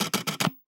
Camera Sequence

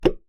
Key Noise, Melodica

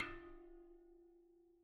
Bicycle Spoke

